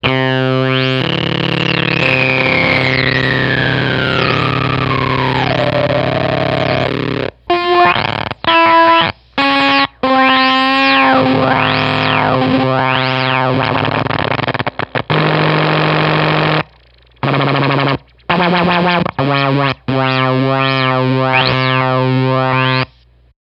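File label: Fuzztest guitar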